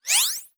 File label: Multimedia Internet CD-Rom Flash Pop Up